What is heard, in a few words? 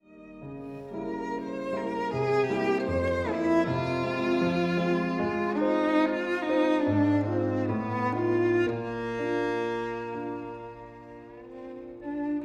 VIOLIN; Trinity College London violin exams for 2016-2019; Tchaikovsky: Mélodie op. 42